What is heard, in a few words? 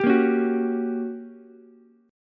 G Minor Major 7th Flat 5th, G, down, Ukulele